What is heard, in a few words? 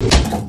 bomb; MQ-9